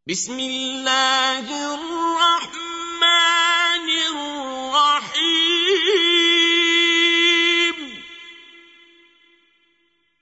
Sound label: Recite, qari quran; Surah Nasar; Qari Abdul Basit